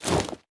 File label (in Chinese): 装备狼甲声